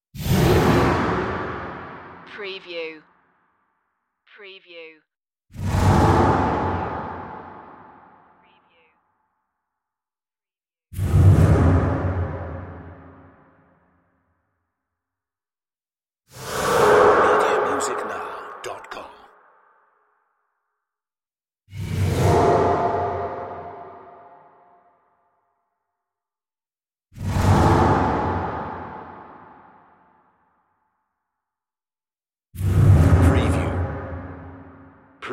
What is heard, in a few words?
evil creature noise